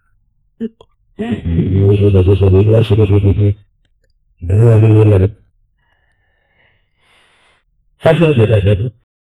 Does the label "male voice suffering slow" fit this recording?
Yes